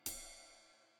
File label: drums ride; smite; raijin